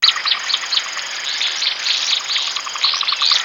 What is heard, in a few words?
ATM SWAMP, Swamp Atmosp; Roland - LA Composer vol. 2